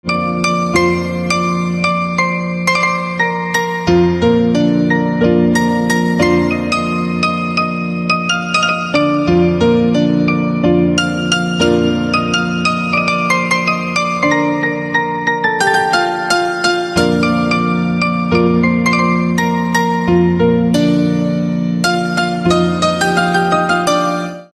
Piano Instrumental Ringtone

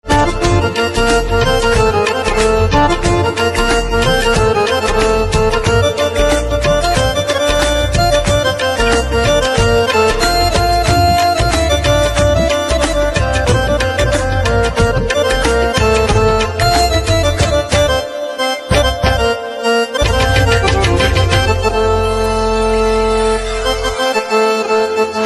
Harmonium Instrumental Ringtone

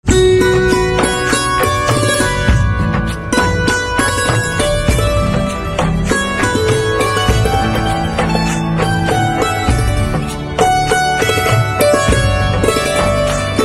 Santoor Instrumental Ringtone

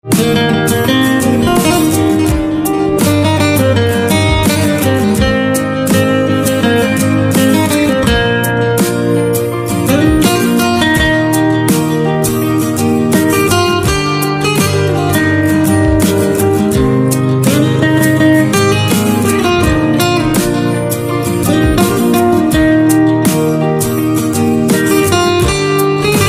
Guitar Instrumental Ringtone

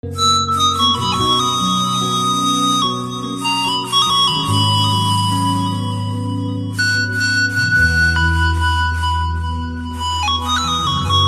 Ringtone

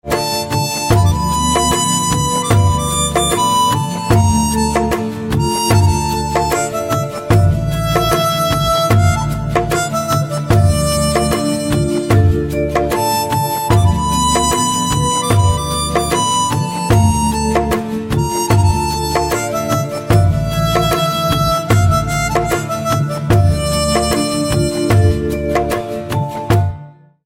Harmonica Instrumental Ringtone

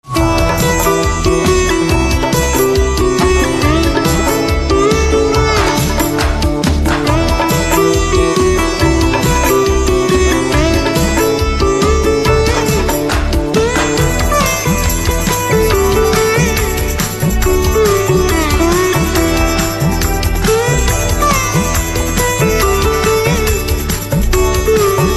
Veena Instrumental Ringtone

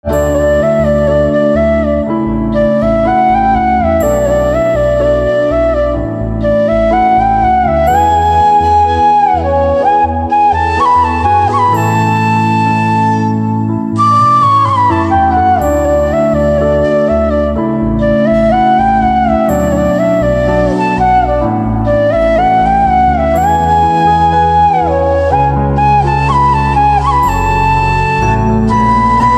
Flute

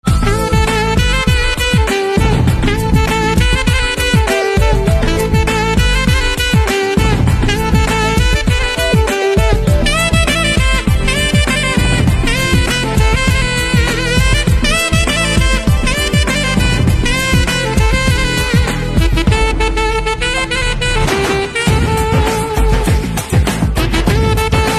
Saxophone Instrumental Ringtone

